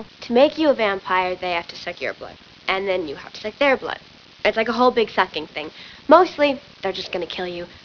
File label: sucking